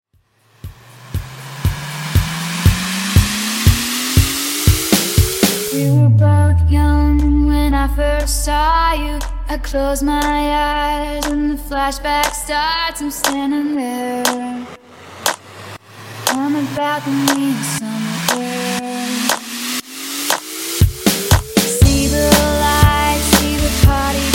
Funk Slam Intro Break Outro 119Bpm

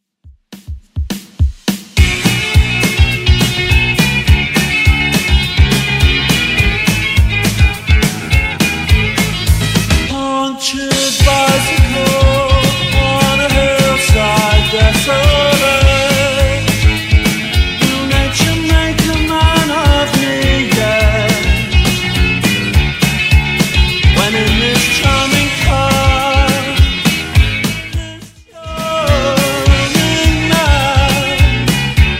80s Redrum 104 bpm

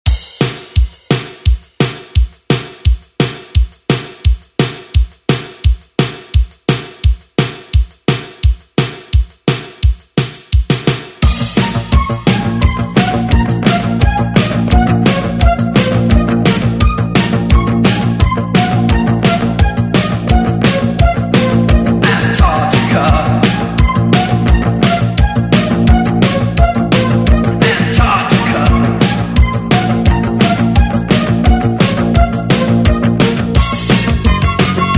redrum; DJ Mhark

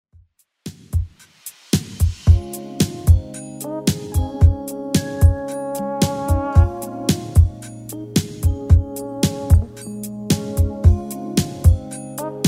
80s 112bpm; Redrum

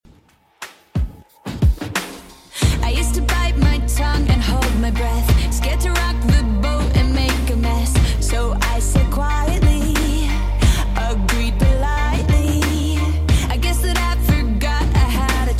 2ks Redrum 90bpm

